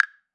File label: normal letter